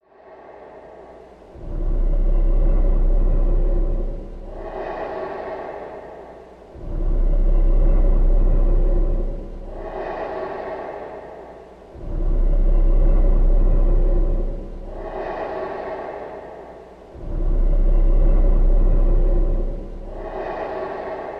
Human Breath Through Reverb, Bassy Exhale For Ghostly Presence; ghosts & demons